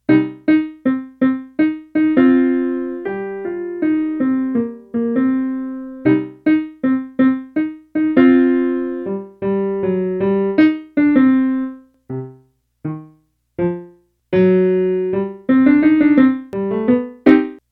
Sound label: jazz blast